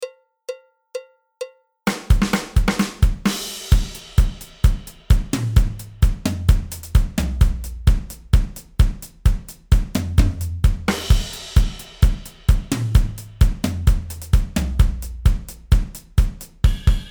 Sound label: style beat, drums